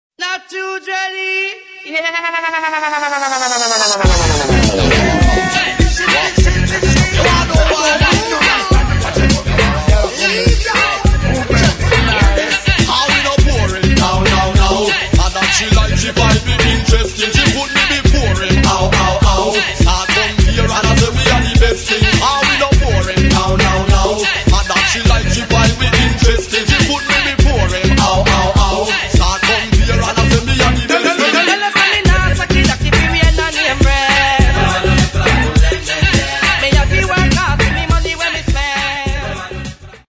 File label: 90s reggae riddim mixed here in a rap remix